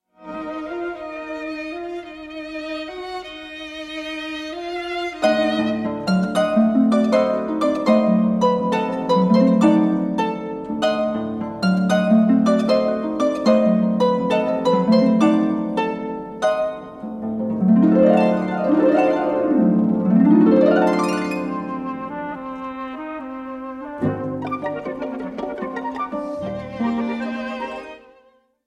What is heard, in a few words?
introduction and allegro for flute clarinet harp and string quartet; maurice ravel